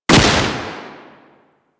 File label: Sapient Pistol Shot; Warframe